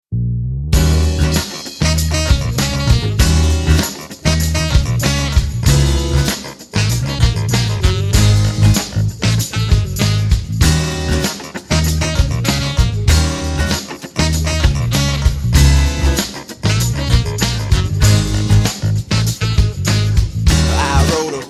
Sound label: funk song